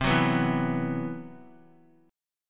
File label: C Major 7th Flat 5th C; CM7b5 strummed; JGuitar